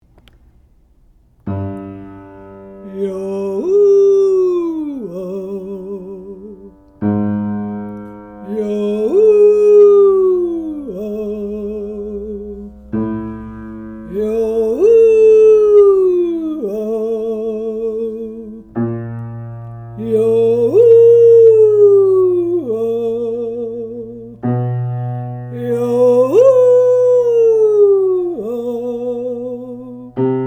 voxbox ascend dive

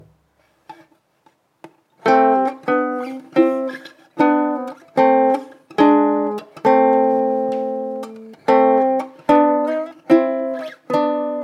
a minor e7 intervals

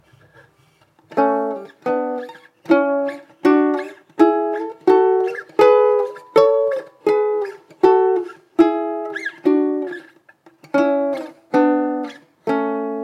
a major string 3 4 interval

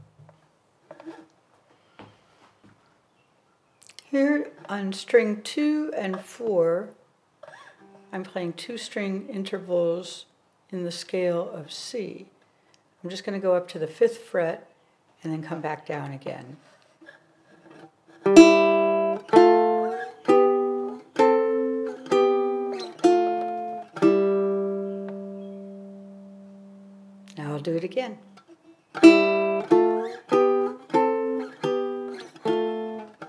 c maj interval

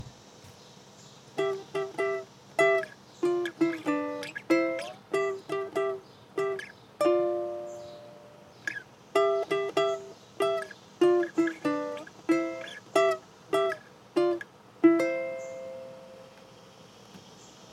c harmonic melody

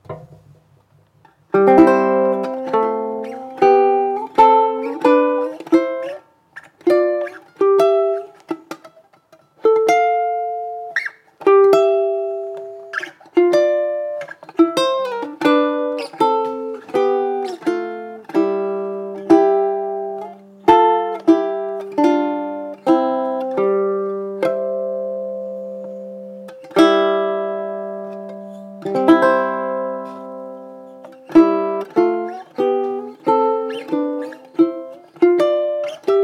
c intervals

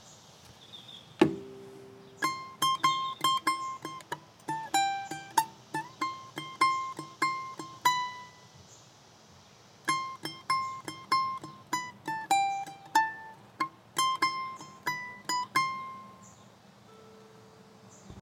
c harmonic melody high

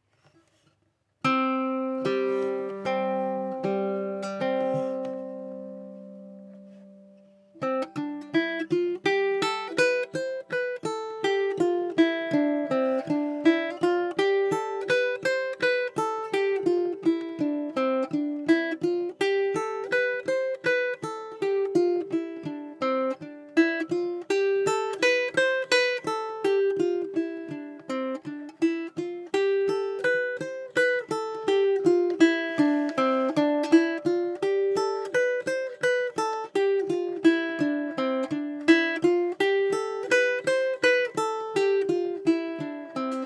c scale adv

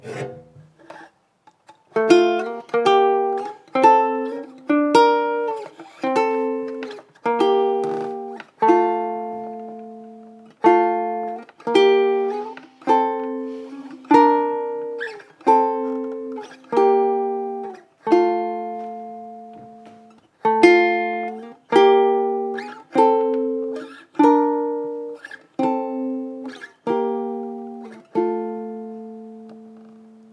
f intervals on 2 4

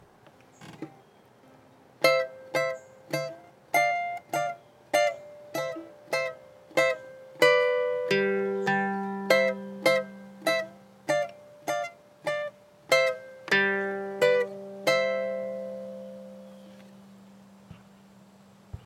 c harmonic melody high

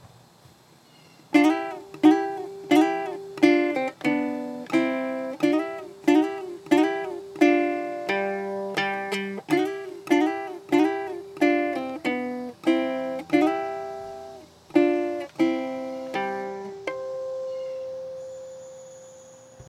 c harmonic melody slide

